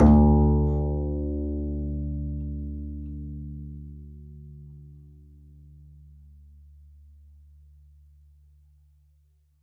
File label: pizz d2, double bass